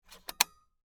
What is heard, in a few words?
HD Camera Close, Hd, Camera, Close, Outer, Cassette, Door, Sony, Hvr-Z5; Technology; Camera Sound Effects